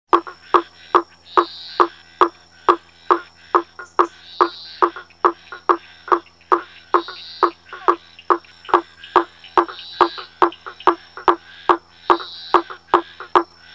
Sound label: Hypsiboas faber sapo-ferreiro; anfibios